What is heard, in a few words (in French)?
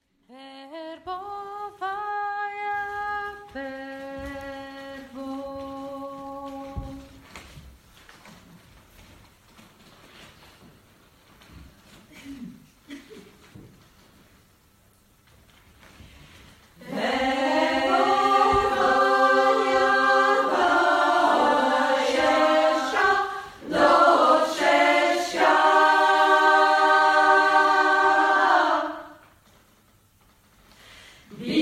Verbovaia chant traditionnel ukrainien; en travail